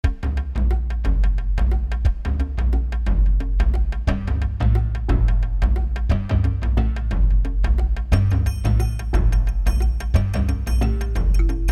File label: Bongo Bango, Music; Incredible Machine 3 (PC)